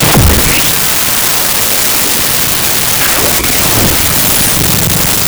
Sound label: starter; Sound Effects Library (28Cds)